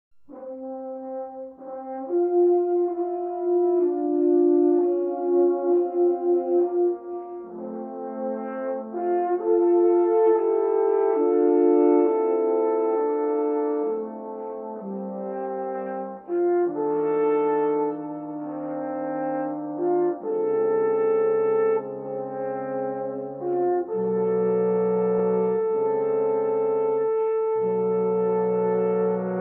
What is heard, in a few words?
taps